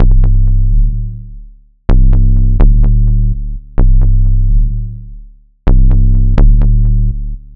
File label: Techno Sub Bass Resonator; instruments; loops